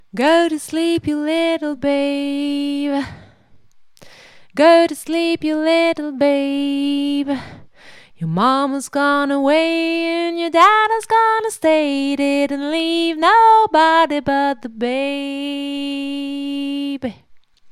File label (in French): voix haute